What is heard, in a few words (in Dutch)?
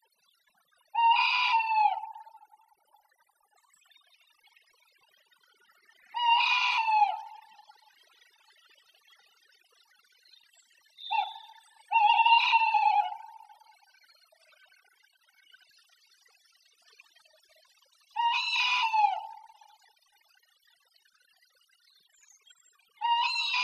Uil Strix aluco